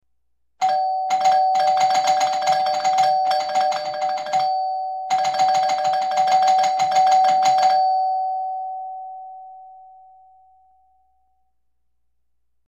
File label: Quick Repeated Ding, doorbell